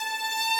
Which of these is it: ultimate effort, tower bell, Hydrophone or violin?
violin